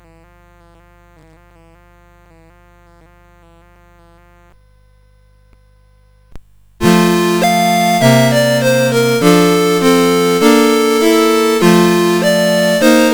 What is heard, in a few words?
Christmas Song, CSG8580R5